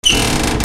SQUEAKS

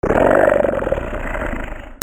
ANIMALS

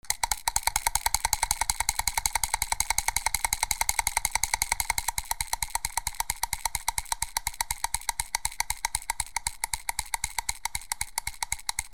TOYS

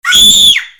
PEOPLE

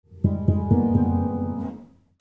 MUSICAL

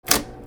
OFFICE

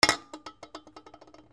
FALLING

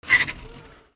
CARS

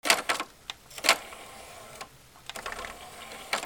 HOUSE

